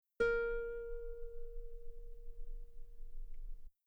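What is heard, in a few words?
guitar A sharp 3 very long piano normal; bananenkeyboard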